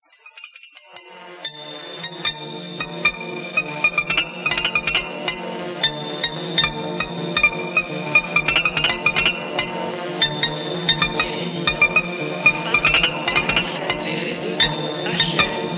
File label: Jingle